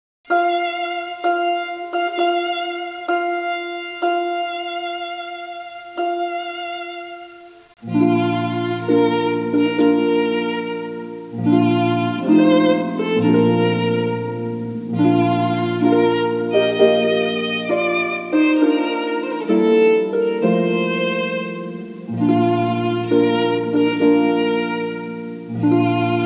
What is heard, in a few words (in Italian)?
marcia nuziale, ARPA VIOLINO; Uscita degli Sposi; wagner